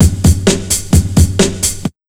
HARD 130, Big Beat Drumloops; USB Soundscan vol.25; AKAI

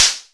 snare mod

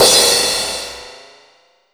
PROCYMB, CYMBALS

